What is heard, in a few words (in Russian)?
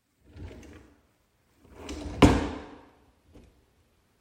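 закрытие ящика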